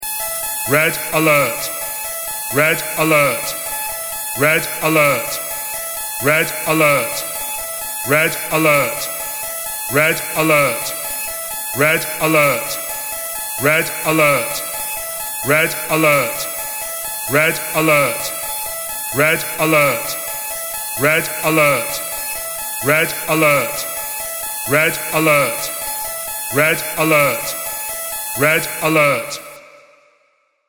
Red Alert Alarm Spoken, Electronic security alarm with vocal repeat of the word ‘Red Alert’.